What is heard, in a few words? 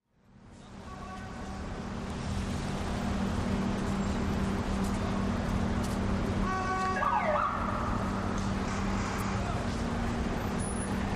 New York City Street, big city